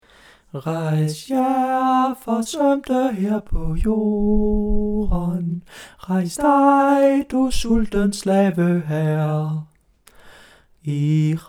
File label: internationale sopran; Arbejderkoret